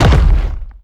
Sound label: step detail, strider; creatures; Half Life Alyx